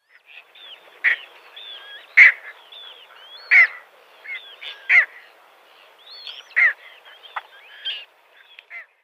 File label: Little Bittern flightcall